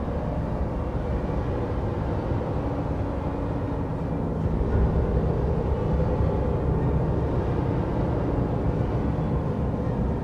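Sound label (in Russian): монотонный шум на вертолетной площадке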